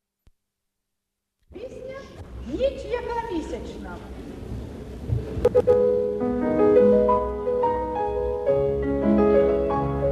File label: Ukrainian song; Zinoviy Babii